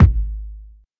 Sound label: fat kick single hit g sharp key